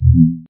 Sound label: timer expire